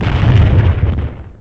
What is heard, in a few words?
explosion